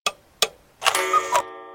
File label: TIMER END; pat & mat game; UI